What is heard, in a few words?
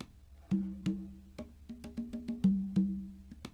SD con; Percussion